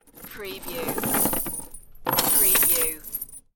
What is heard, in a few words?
Heavy Chain Metal Rattling Twice